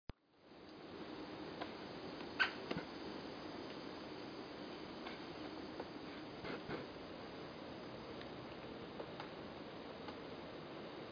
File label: oosutonooakagera, bird song; オーストンオオアカゲラ; 地鳴き（1鳴き）; 鹿児島県奄美大島 自然観察の森